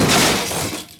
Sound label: smash